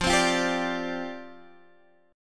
C Suspended 2nd Suspended 4th, F

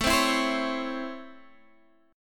Db Minor Major 7th Sharp 5th A

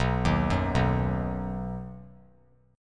B Major, 3C,3F,3A,3D; arpeggiated; JGuitar

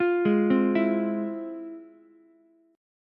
F Minor Major 7th, Ukulele, chord

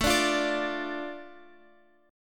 A Suspended 4th Sharp 5th, Guitar Open F; Asus4#5; strummed

